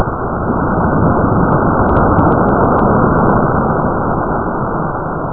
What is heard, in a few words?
sea